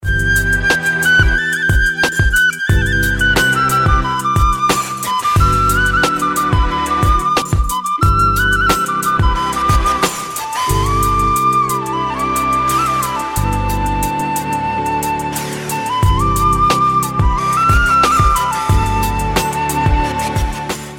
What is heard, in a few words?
Nice Flute